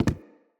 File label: Walk Wood, Footsteps; Characters; Enemy; Mockeries; Mockery